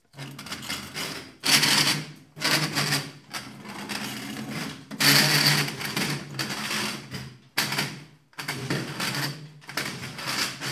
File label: Metal Creaks